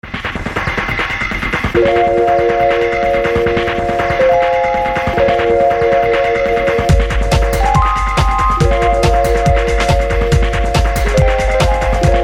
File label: train